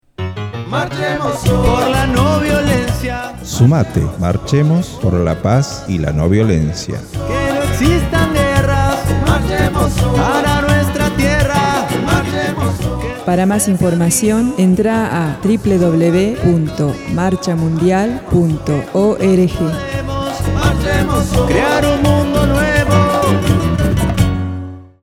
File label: jingle